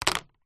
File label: household remote control tv plastic set down wood table; zapsplat